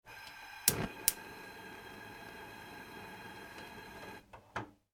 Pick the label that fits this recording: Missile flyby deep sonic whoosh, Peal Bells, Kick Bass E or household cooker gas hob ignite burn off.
household cooker gas hob ignite burn off